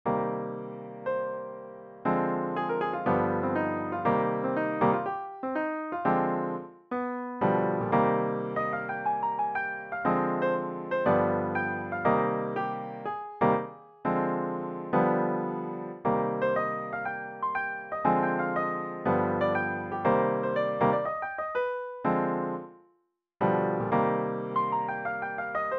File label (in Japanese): アドリブスケール24小節